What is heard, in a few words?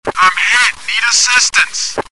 hit; chatsounds